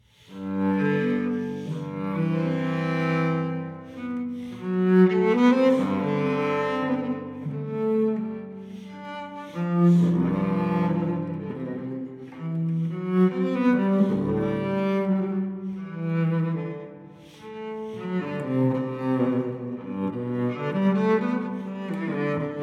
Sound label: Cello Suite No. 1 in G major Sarabande; BWV1007; Johann Sebastian Bach